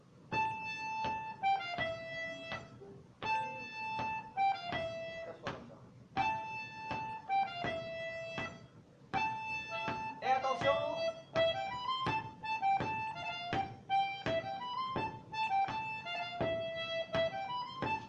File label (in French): Andro Voix 2 flutes; Nouvel Andro; Musique d-ensemble